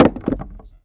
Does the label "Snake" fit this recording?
No